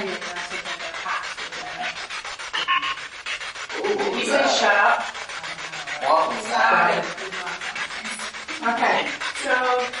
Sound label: Civil War Wing Basement Spirit Box; madison seminary investigation